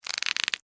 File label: Insect Voice Wet, Insect, Voice, Wet, Clicks, Vocalization, Bug; Sound Effects:Animals:Insects; Blastwave